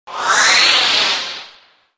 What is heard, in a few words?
flash